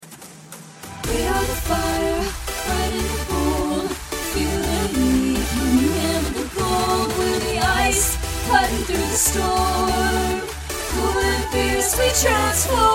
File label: Sample, female singers